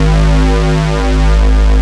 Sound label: V-S BASS